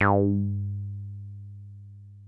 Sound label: 303 F#2, TB 303; Hollywood Edge; Giorgio Moroder Rare Synthesizer Collection